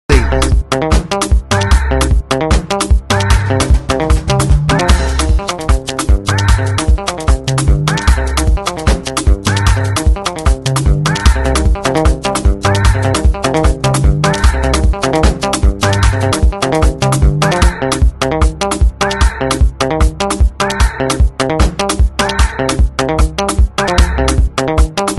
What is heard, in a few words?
Free Beat